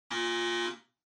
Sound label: Electromagnetic Buzzer Room